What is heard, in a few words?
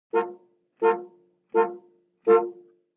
60 Plym Int Car Horn